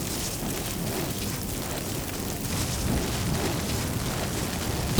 bear fire loop; LoL; annie base

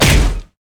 steam golem basic attack; LoL; blitzcrank

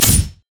riposte block; LoL; fiora

